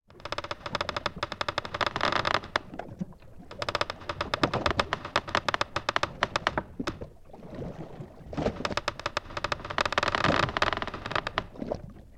transport boat wooden rock sway waves below deck wood creaks galleon